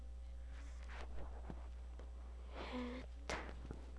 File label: hit